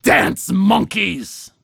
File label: Jukebox